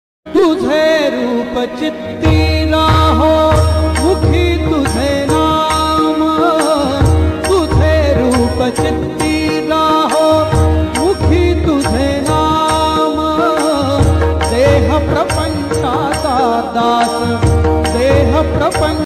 god ringtone vitthal ringtone pandurang Marathi ringtone devachi ringtone